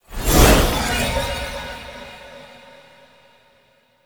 Horse Disappear; Vehicles; Foley Actions; Warframe; Duviri

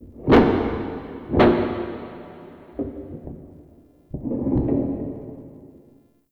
Freaky Taps; Warframe; Shadowgrapher

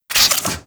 Fire Tail; Warframe; Weapons

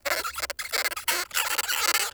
Forest Rodent Squak; Warframe; Grineer Forest

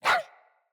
Puppy Idle Bark, Vocals; Kubrow

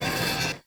Piston Raise Short; Warframe; Eidolon Plains

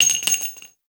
Shell; Dual Vipers; Weapons

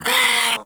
Catbrow Feral Vocal Pain, Enemies; Warframe

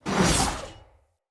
Knight Axe Whoosh; Weapons; Enemy; Axes; Snc Knight Axe

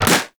Primary Shot, Weapons; Warframe; Sapient

